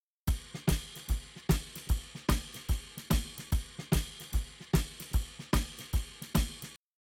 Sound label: Drums, shuffle drums; WDR2